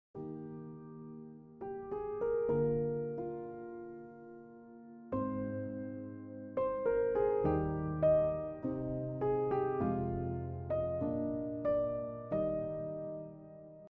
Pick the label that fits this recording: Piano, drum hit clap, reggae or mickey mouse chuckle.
Piano